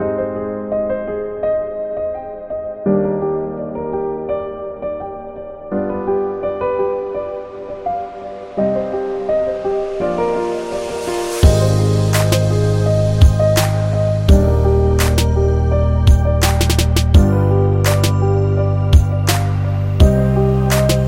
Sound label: Fashion Hip Hop; medical background